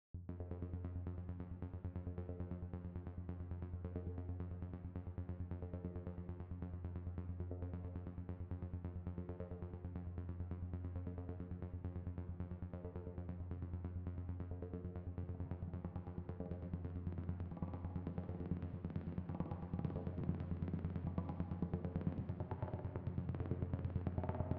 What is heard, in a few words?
urgent, dark sci-fi; dark sci-fi audio pack